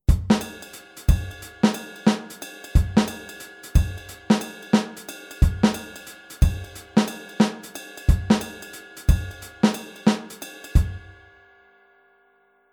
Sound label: Groove off; shuffle offbeat